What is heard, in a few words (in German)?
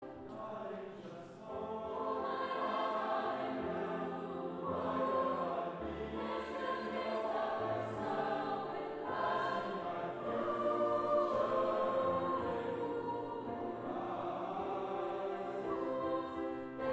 Chor; Musikdarbietungen bei der Kunstnacht